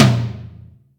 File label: Room; Yamaha DTXpress; Acoustic Tom